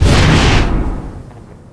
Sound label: laser